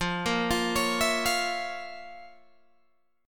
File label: F Major 7th Suspended 4th Sharp 5th F, Guitar Open G